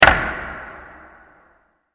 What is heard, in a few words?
Bursting Wood Impact; Bursts; Impacts; Crafted Signals